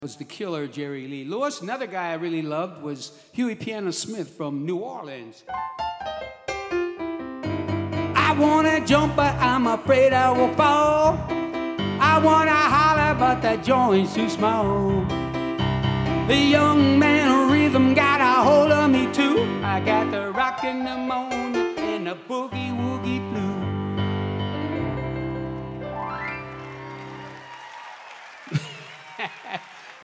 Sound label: Piano Moment